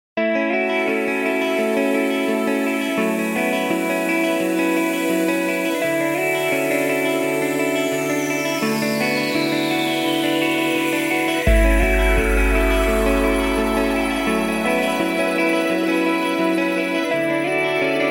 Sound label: Melodic Pop Guitars